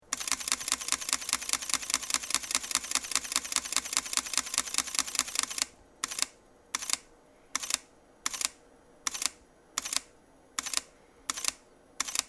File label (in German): Sony Alpha 7 Mark II Serienbildfunktion Raw